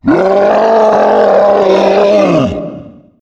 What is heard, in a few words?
Tank Yell; cstrike; zombie plague